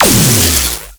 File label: Antimatter Drop Fully Charged; Warframe